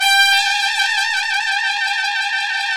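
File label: BRS TPTS, brass trumpets FX; Roland L-CDX-03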